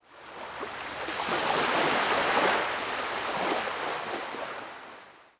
WAVE, water; zones

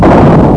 bomb; MacSweeper

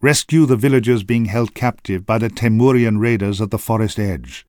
villagers; kult

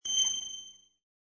New Messages; Flyakite OSX v3.5; Iceman

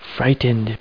frightened; Total Distortion Big Demo

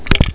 thump; AVX demo

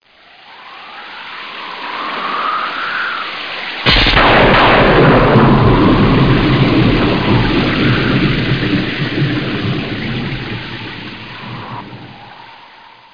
lightening; DR BRAIN; BUGS

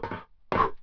ladder; PC Gamer IT CD 41; Daikatana

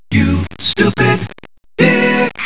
STARTUP; privateparts

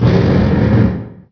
burner2; MACHINES

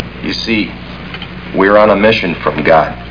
blues; Website Designer Tool Kit

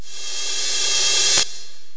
REV CYMB

